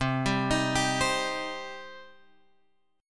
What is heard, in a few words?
C Minor C down Guitar Open G